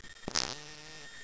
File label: fart